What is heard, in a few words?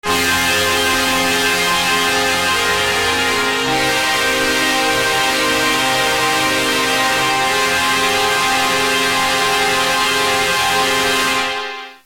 strings; synchrotron; southpole